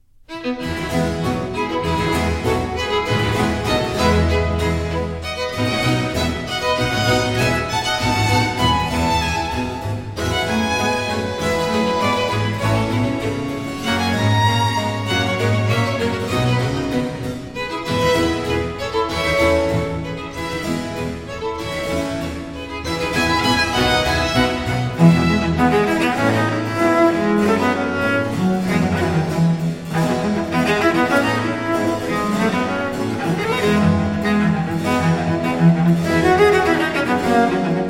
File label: concerto for cello in f major allegro